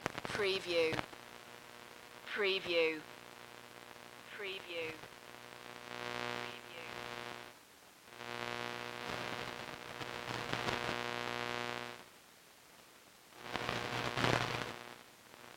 Walkman Static; Sony

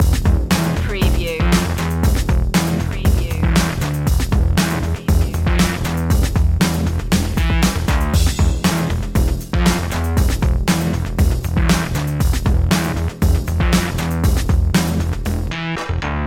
thunder loop

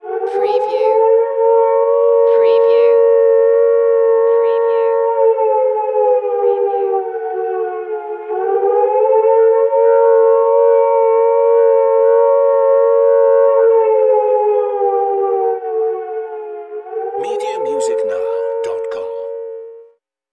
Air Raid Siren Ghostly

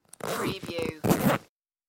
Zipper Zip Down Then Up

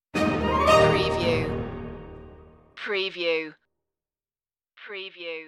toys marching stinger

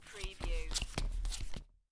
Playing Cards Dealing Three Cards Flop

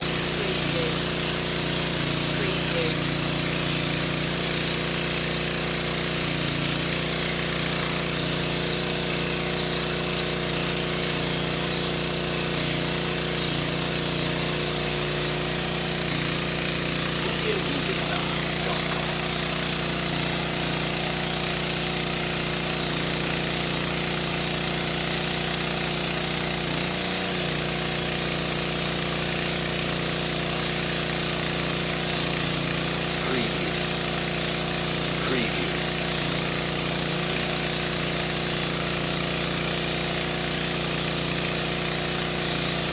diesel generator

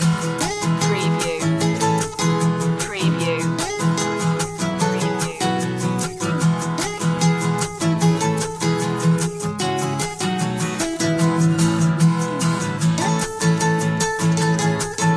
marimba surfer loop

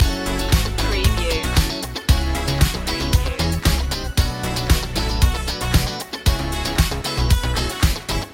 showtime loop

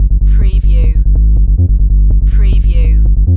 Techno-Trance Sub Bass Loop in G 142 BPM

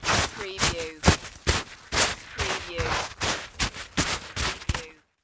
Snow Movement Dig; sound effects bible

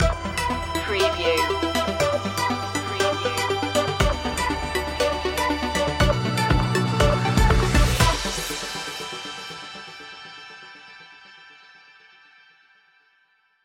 firebirds stinger

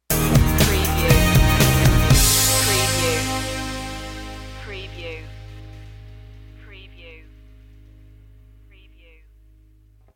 starstruck stinger

